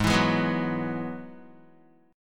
Ab Minor 7th Sharp 5th Ab, down, Guitar, 4E, 4A, 5D, 5G, 5C, 6F; strummed; JGuitar